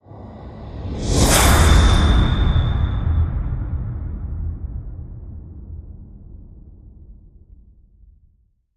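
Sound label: Hit Swoosh Fusion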